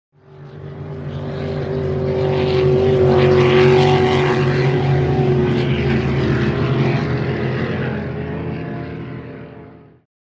Power Boat By Fast, off shore power boat

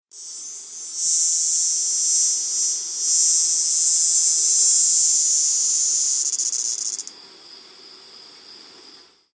Cicada CU Buzz Crix BG; cicadas

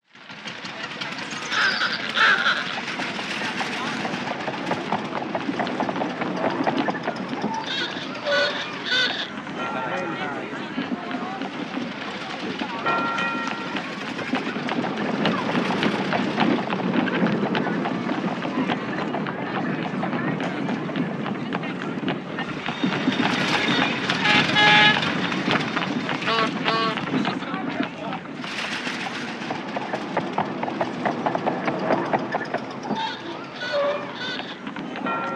Traffic 1908, 20s 30s

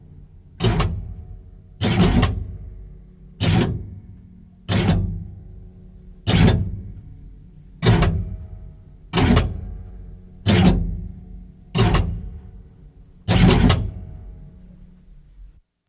Metal Clunks Higher, gears

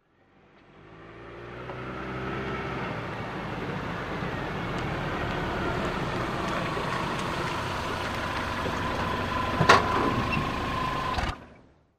Sm Car In Park Manvr, compact

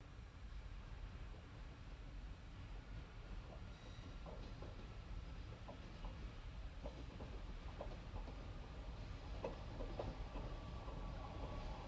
Diesel Train Backs Up, Wheels Close Up

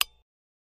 Light Switch Lamp

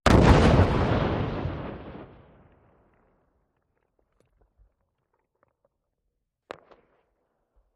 1x16oz 1x4oz 2x2oz B

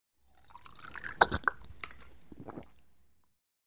Water Squirt Gulps, water bottle

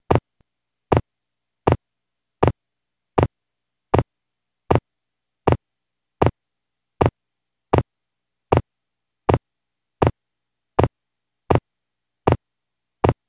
Horse Hoof Multiple

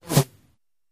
Arrow Whistle, bow & arrow

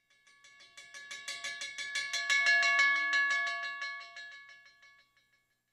Train Bell Bys, crossing bells

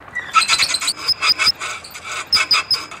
Pulley Rotates Sque; pulleys

